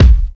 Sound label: fluffy kickdrum b key, Royality free kick drum single shot tuned to the B note